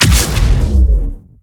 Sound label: rift explosion